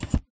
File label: gear, combine soldier; npc